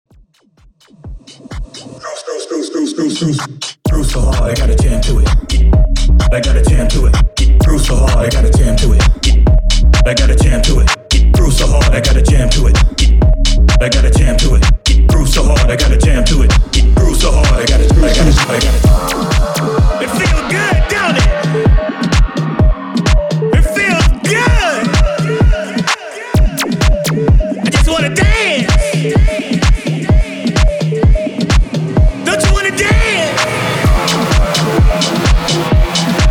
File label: VIP CUT 128 BPM